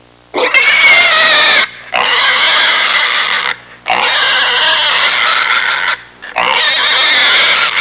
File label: Pig squealing in fear